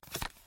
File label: Player Change Card; Game rip; MiSide